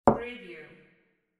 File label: glass cup set down